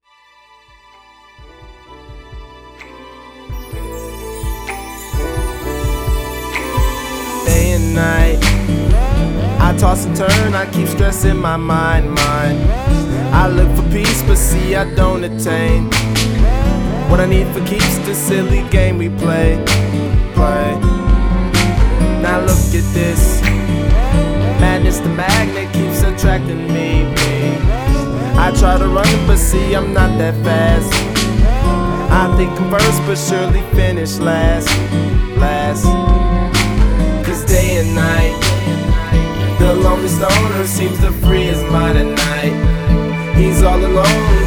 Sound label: Poetic Bossa; Gurando no Mori